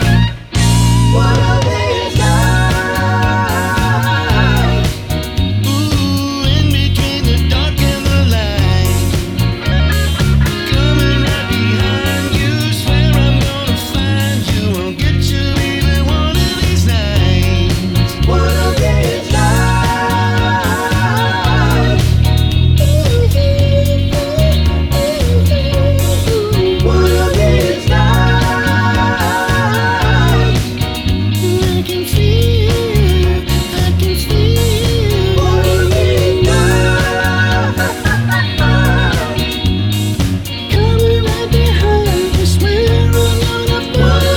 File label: Tribute Band